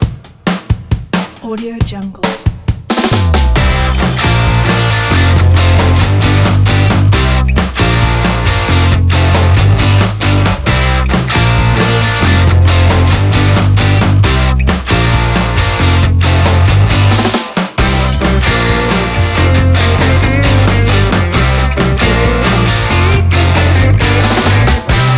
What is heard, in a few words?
60s rock; andyslatter